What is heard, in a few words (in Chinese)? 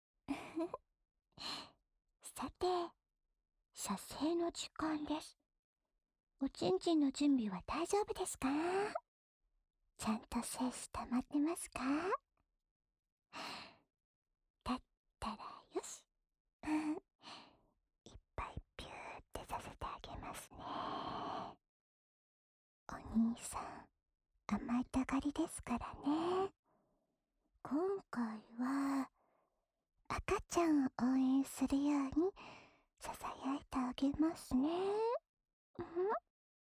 自慰辅助用-唾液较多的舔耳手交（右耳）, ASMR